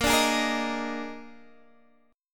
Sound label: Eb Major 7th Suspended 4th Bb